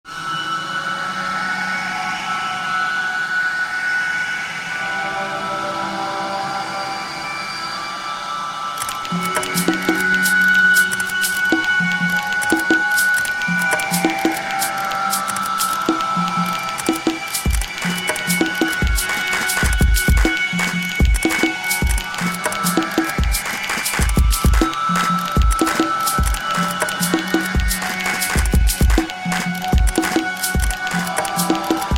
beat

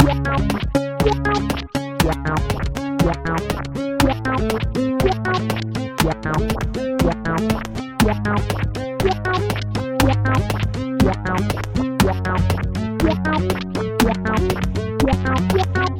electro

